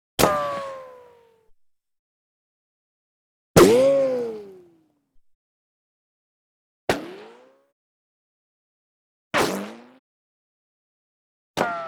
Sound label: Ricochets